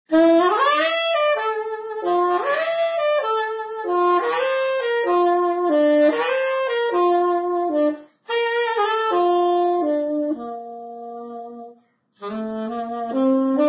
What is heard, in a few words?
Saxophone; Zomboid; Lifestyle